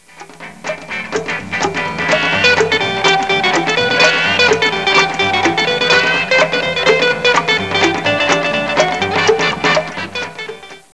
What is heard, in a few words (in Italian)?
musica strumentale